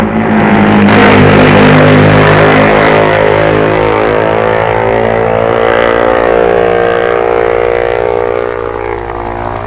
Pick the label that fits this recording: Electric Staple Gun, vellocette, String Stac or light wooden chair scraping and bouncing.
vellocette